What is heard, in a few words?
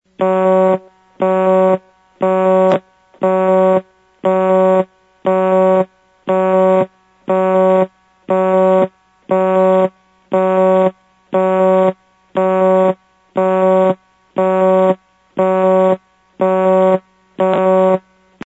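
Busy, Stromberg Carlson X-Y Switch; sounds from preserved step by step switches; Phil McCarter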